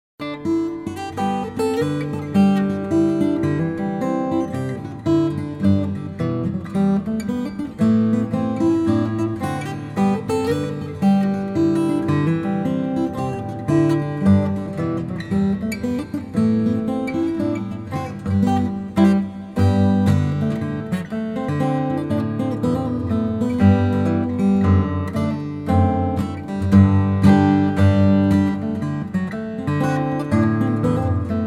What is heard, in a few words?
new fiddle tune